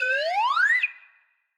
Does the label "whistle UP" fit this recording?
Yes